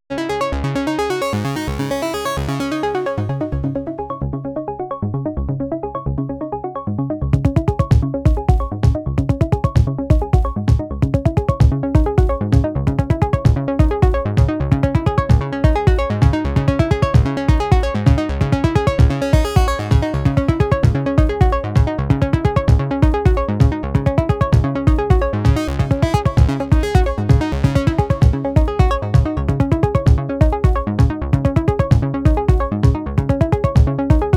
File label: Synth Mix Dry